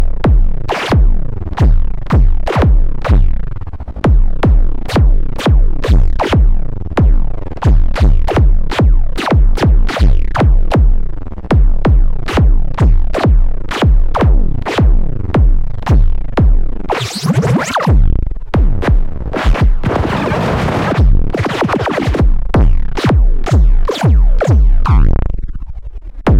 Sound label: KICKDRUM